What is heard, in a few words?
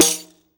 TAMBOURI.HIT; Best Service; World Colours